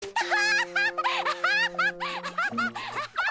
noises; gabriel dropout; satania

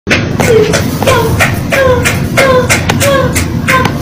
applause clapping crowd

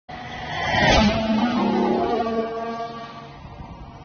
mclaren f1 sound

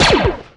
pistol; scout

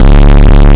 bass boost

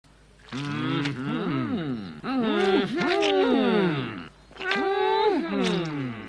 hummm hum; tintin; dupon

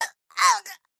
slap making; mmd

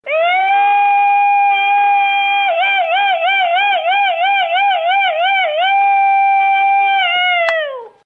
sapucay

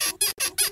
bed squeak

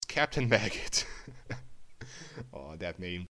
heh

